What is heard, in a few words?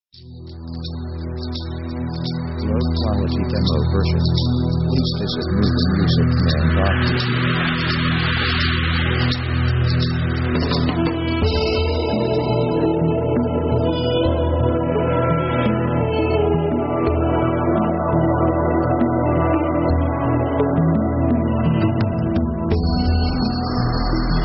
pop powerful ethnic; contemplative themes